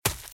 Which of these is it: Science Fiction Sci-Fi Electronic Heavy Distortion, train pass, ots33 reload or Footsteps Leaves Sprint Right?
Footsteps Leaves Sprint Right